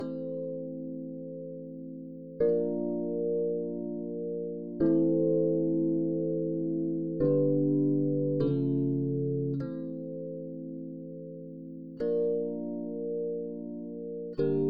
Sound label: rhodes; Toki Tori Collection